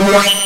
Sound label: WAWAWA; Soundengine - CSR-000 The Art of Transwaves; MOVING PART